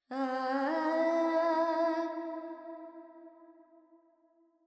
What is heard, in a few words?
Verb Charukesi, Carnatic Female Alaps; Vocals; Sample Banks